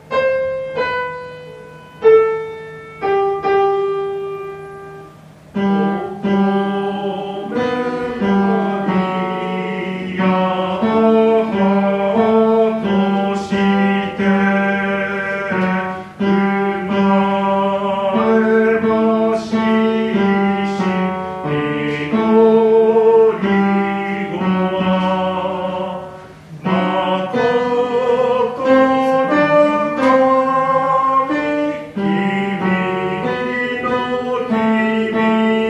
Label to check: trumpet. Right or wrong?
Wrong